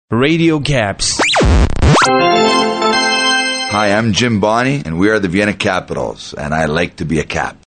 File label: Jingle w Organ; Latinisimo Productions